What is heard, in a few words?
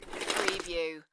Snow and Ice, Ice skate slide; Weather sound effects